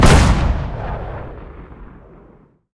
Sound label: aa fire big, guns